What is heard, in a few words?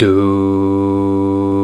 VOX, Male Scats